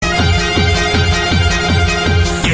coches de choque

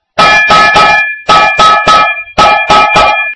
cacerola

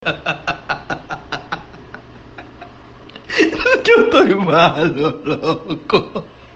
risa

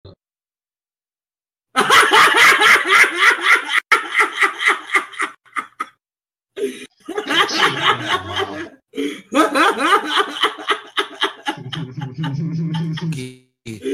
risa

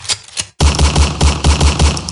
pistola

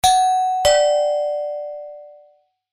timbre infernal

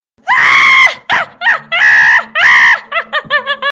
risa

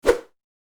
transicion

